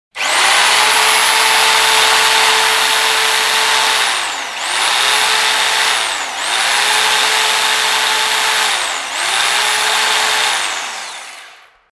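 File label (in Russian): звук перфоратора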